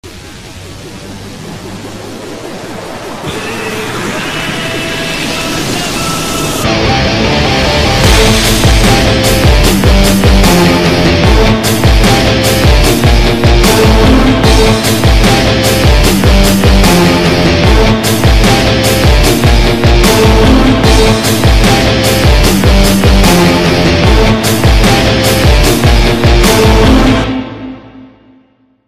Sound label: entry bgm; Abraham Ozler; Mammootty